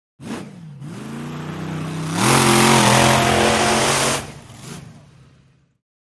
Monster Trucks; Revving By, Monster Truck Revving, Races By Thru The Obstacle Course; Shot Cu From The Obstacle Course.